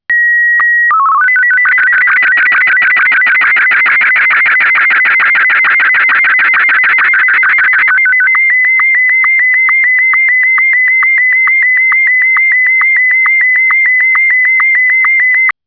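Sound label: SSTV M1, Hobby Radio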